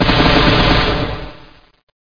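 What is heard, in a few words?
weapon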